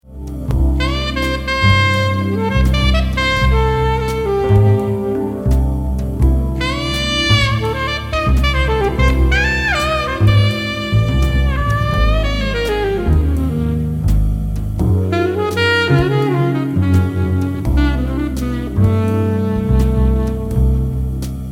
Song Alto; Saxz Silv

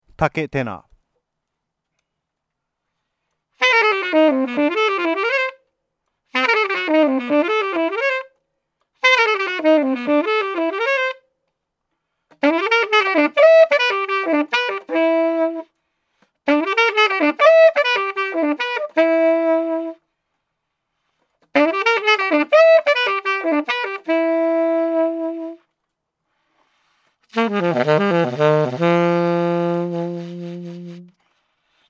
Tenor

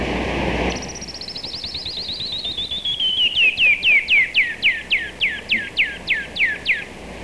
canyon wren

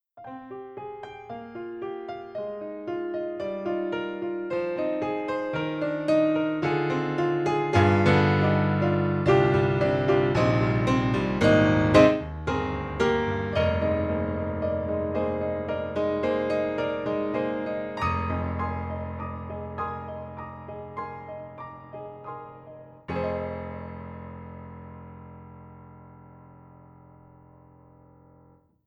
ivory c7, piano; synthogy

